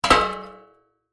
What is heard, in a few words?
Metal Impact Drop Metal Pipe On Metal; Large metal pipe impact with metal; Metal Sound Effects